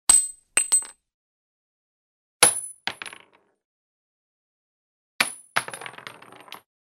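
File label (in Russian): падение гильзы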